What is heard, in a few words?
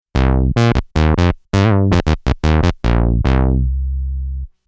BWAAH Poly800